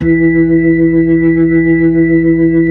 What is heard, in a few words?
B-3, Blues; Keyboards of The 60's and 70's